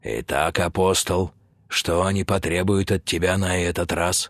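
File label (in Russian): garmon, accordion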